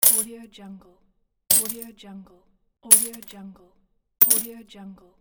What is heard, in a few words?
single coin being thrown; federico manuti